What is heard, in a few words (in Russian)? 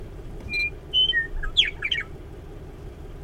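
щебетание на фоне леса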